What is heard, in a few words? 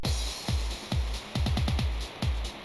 8bit